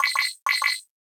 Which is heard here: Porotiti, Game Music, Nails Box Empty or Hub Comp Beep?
Hub Comp Beep